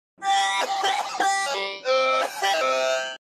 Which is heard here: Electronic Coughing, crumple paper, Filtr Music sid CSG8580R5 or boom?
Electronic Coughing